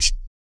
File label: BOOGAROLL, Vocal Blues Soul